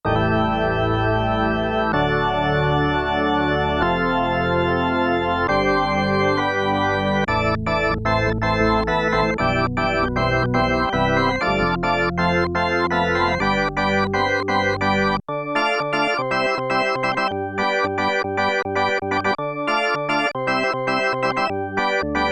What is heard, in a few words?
Organ; General Performance